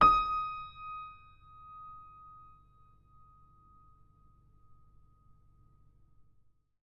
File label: D sharp 3, piano